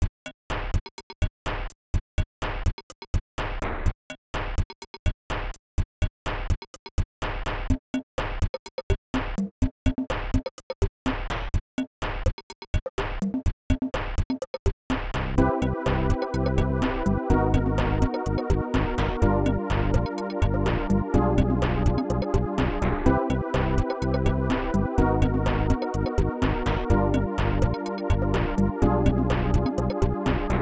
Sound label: drums; Weird drums song; made for games